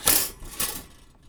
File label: Toaster; BB Retail Therapy Sample Pack